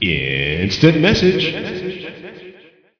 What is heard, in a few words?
Hiphop Instant